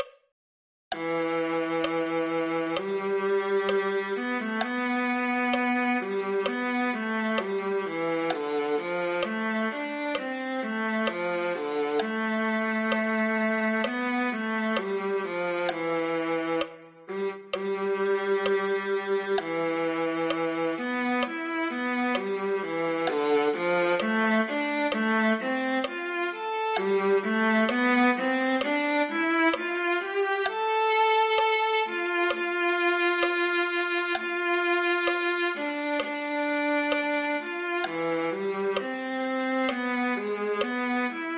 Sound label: viola